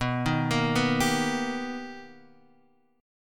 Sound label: B Augmented Major 7th B down Guitar Drop D